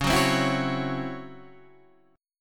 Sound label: C sharp Minor Major 7th Double Flat 5th Guitar DADGAD